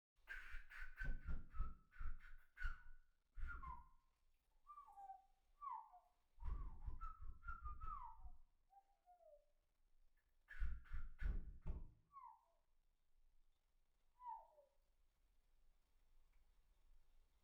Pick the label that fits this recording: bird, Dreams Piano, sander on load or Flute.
bird